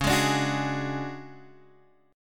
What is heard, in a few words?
D Major 7th Flat 5th Db, chord